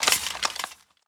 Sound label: suit, footsteps